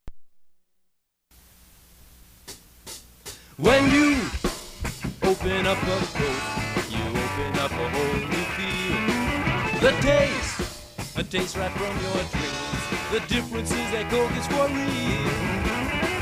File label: Coke Jingle; Web Commercial